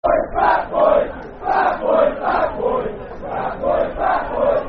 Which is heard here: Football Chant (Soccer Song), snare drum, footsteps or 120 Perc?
Football Chant (Soccer Song)